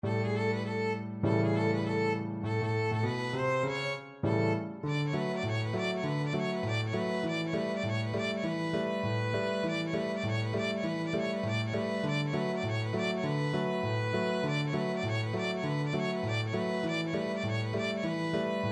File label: rag, violin; 12th street